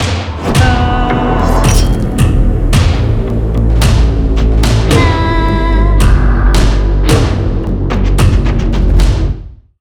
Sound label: Alert Sound Subscriber